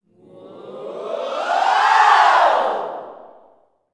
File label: whoops, Vocal Gospel yell shout; Spectrasonics; Vocal Planet Vol.1 Gospel; akai